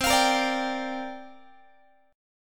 C 9th Suspended 4th, Guitar, chord; strummed; C9sus4

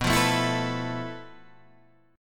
F Major 7th Flat 5th B, guitar chord

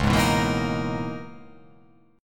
Db Minor 13th, chord; Dbm13 strummed; x,4,6,4,4,5,5,7; JGuitar

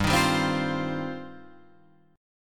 G 7th Suspended 4th Sharp 5th, Guitar Drop D

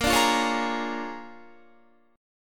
Bb 7th Sharp 9th Bb, Guitar; Bb7#9 strummed; JGuitar

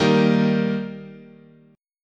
D sharp Major, chord

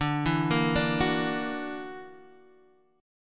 Gb Augmented 7th D

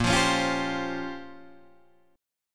Bb Minor 6th Add 9th Bb down Guitar Open D

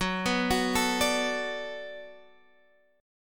B Minor 7th F sharp, chord

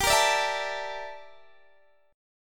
G Minor 6th Add 9th G down Guitar DADGAD

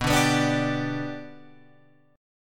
B Minor Sharp 5th, Guitar, Open F; Bm#5 strummed; 11,9,11,9,10,9; JGuitar

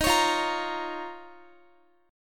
D sharp 7th Suspended 2nd D sharp, Guitar; D#7sus2; strummed; x,x,15,18,17,18; 4F,4A,5C,5G,5C,6E; chord shape

